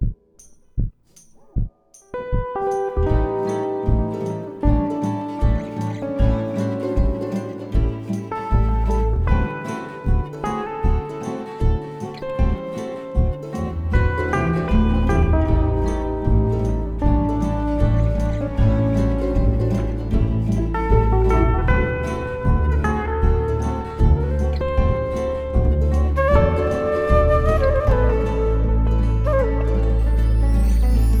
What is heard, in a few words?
Fun Feel Good Blues Folk Guitars Uke Flute